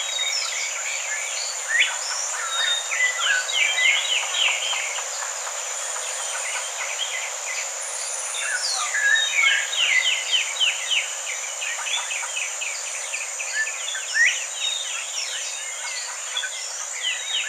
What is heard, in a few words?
jungle exterior